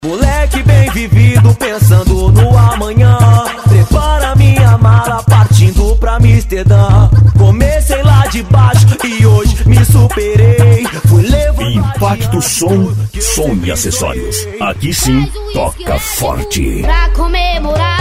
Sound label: funk bass; impacto som